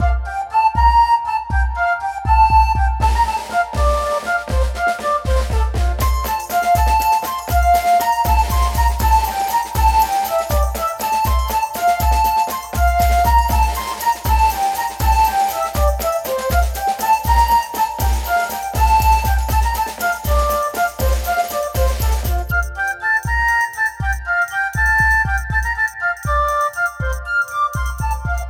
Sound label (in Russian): irlandskaja dzhiga, музыкальные подложки